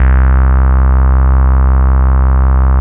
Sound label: BASS L, Drum & Bass 175 BPM; Ueberschall; Drum & Bass Flash Trackz